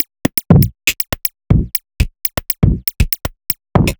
Glitch n Groove, Percussion, 120 BPM; Sonic Foundry (Sony Creative Software); Underground UK House & Electro

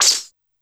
Laser Gun, Yamaha PSR-E323 SFX Kit

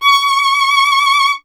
STR VLN, Vln Detache; Miroslav Vitous

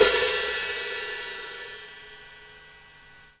hh hit, HAT, Drum Kits; Big Fish Audio - DOM; Redrum

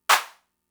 Clap, Fricke MFB-522, Drum Machines

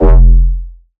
Bass F, Bass Synth

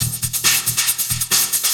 percussion loops, Techno; PS-45

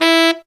TENOR E; Zero-G; Phantom Horns

